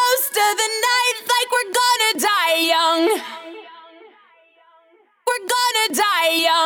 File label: Lead Vocals